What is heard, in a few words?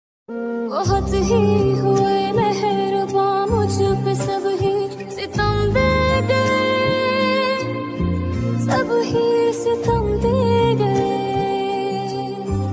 pakistani ringtone